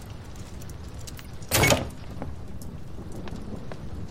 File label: locking door opening; roblox